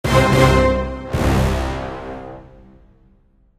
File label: stinger; Secret Agent